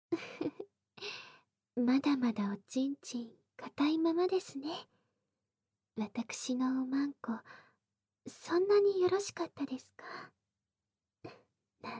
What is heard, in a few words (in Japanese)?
ゆったり耳舐め; 本茶臼; 効果音あり